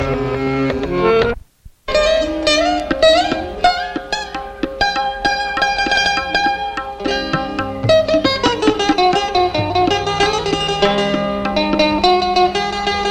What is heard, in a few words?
Lehra in Mandolin Vadan; Rasia